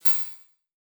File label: Multimedia Internet CD-Rom Flash Ding